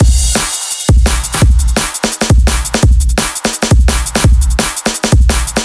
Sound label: Tight Rooms, 170 bpm